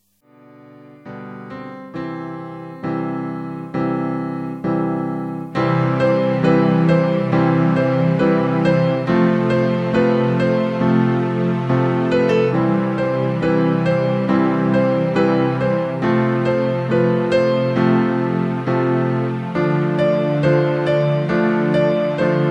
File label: Sunday Instrumental